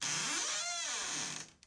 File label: door opening; fnaf 4